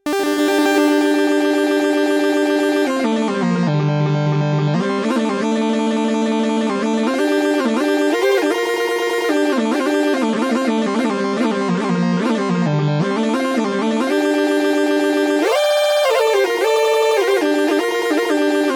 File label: Strymon Magneto Pitch Quantize One Knob Melody